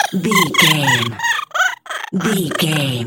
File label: goblin fairy laugh high